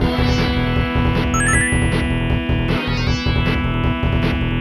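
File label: background music; Mega Twins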